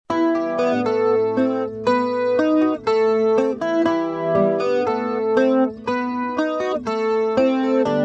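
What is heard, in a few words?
acoustic guitar cls222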